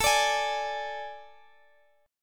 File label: A Major 7th Suspended 4th A; AM7sus4; strummed; x,x,x,14,15,16